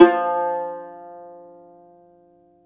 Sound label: cumbus saz F, plucked strings; E-MU Producer Series Vol. 7 – Old World Instruments